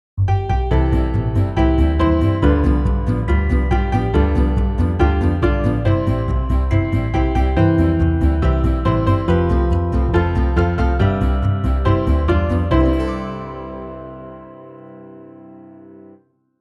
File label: happy, child